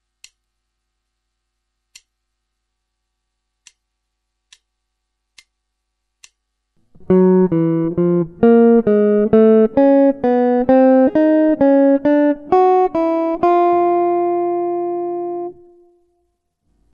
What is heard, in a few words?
guitar